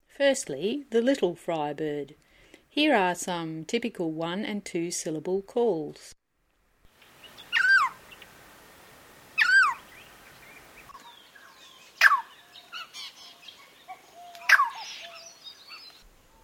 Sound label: BIRD friarbird little